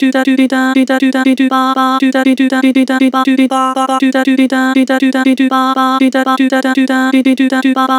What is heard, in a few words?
Vibrato Chop, Harmor Vocals